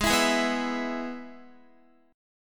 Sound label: Ab Minor Sharp 5th Ab down Guitar; Abm#5 strummed; JGuitar